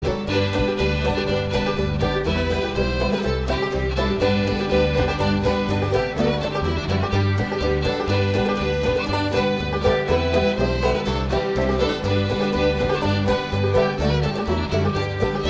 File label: Seneca Square Dance, Stringband